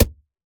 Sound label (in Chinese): 泥坯破坏声